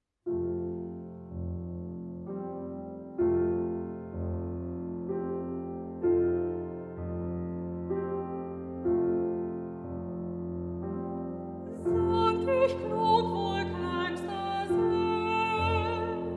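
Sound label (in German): siebenbürgische Kantorei; Klavier; Ortsmundart: Hermannstadt; Sangtijklok; Klavier: Prof. Heinz Acker; Hegt wird gesangen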